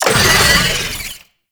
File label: Boss Vocal Pain; Warframe; Oraxia